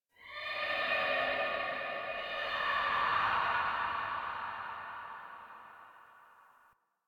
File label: Interior Texture High Screech Calls; Ambience; Misc Indoor; DE; Warframe; Infested Eris